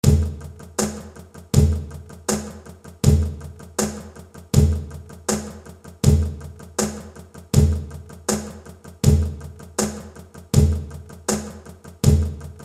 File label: FUNK CAJON GROOVE